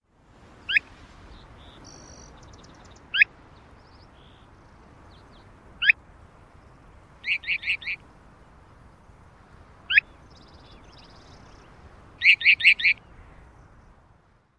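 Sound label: Mountain Plover, calls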